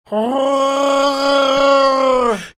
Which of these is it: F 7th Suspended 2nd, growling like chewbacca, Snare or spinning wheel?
growling like chewbacca